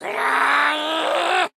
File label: Stripe Charge